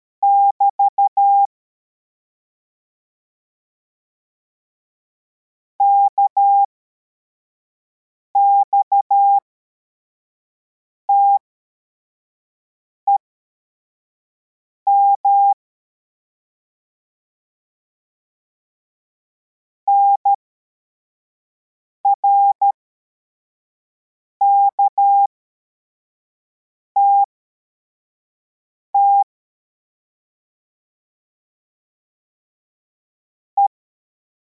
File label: cw, morse